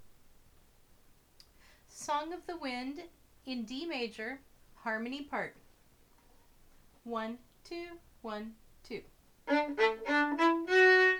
Harmony in D Major; Song of the Wind

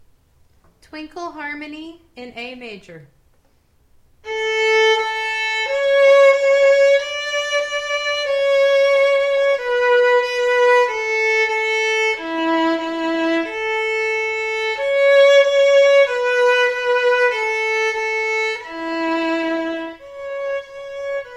Twinkle Harmony in A

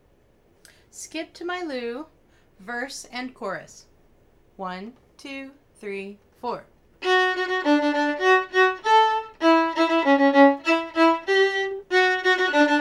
Skip to My Lou melody

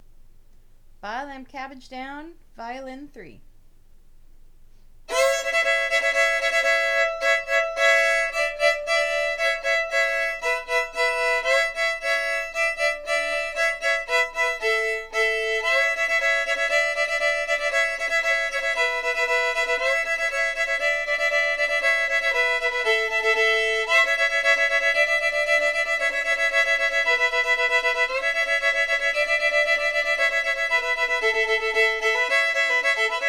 in A violin; Bile 'em Cabbage Down

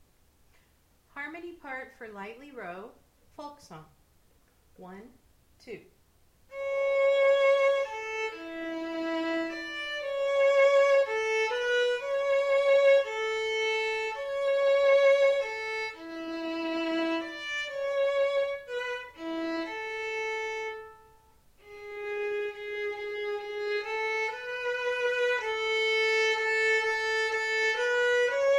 Harmony

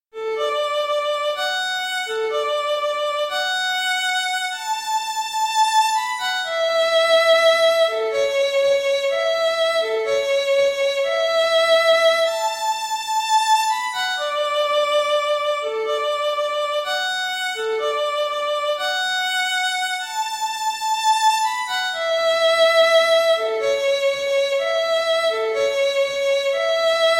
melody

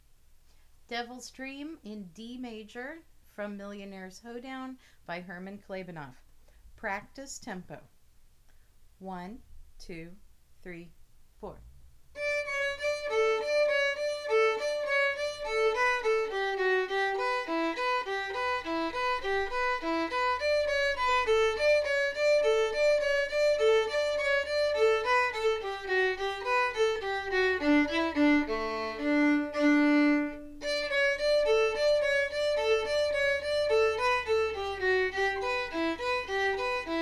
in D practice tempo

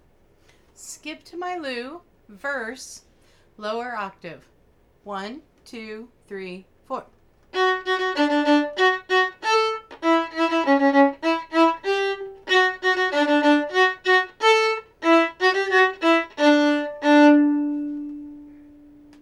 Skip to My Lou Verse lower octave

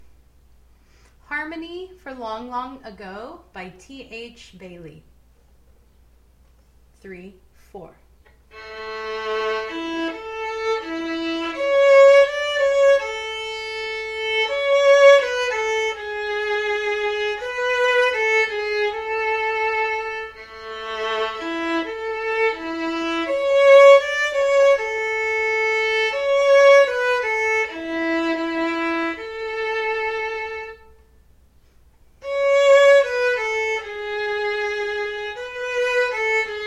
Harmony

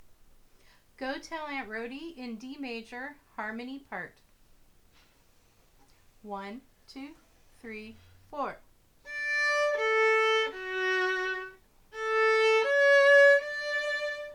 Harmony in D Major; Go Tell Aunt Rhody

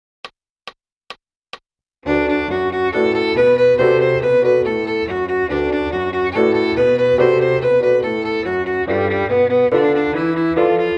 Boogie Woogie

